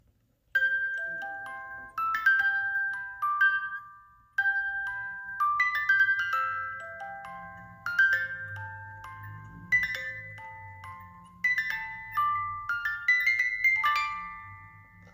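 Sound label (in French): music box, 18 lames; Boîte à musique en bois personnalisable.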